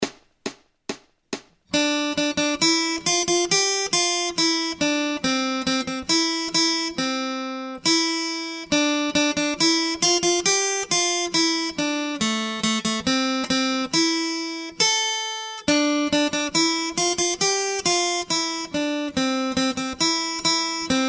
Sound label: Acoustic Accompaniment, acoustic guitar